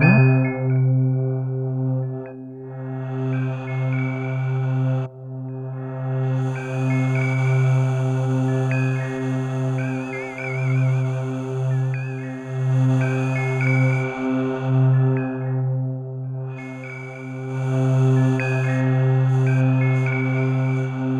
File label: C3, Synth Line; 90 s Sample CDs; NiceBeats; SynthLine VOL-17